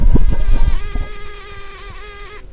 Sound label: bumblebees, Pascuorum queens